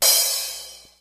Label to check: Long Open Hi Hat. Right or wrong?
Right